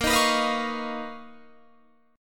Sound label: B Major Sharp 11th A sharp